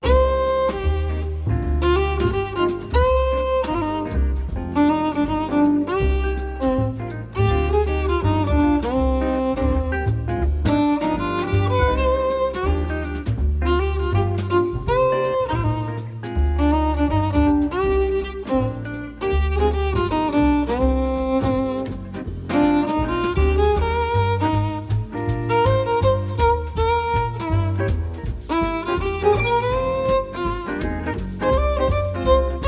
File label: softly jazz, jazz quartet